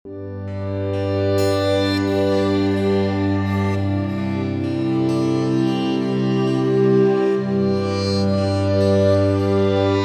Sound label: Music